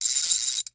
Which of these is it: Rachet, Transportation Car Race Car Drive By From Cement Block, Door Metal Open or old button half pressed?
Rachet